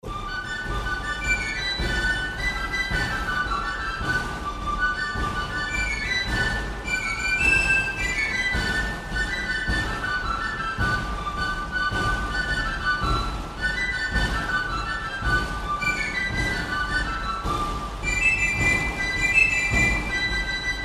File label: Farandoulo Tambourin; Farandoulo dei Tarascaire; Academie Tambourin